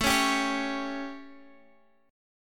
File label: A 7th Guitar Drop D, chord; x,x,7,6,8,9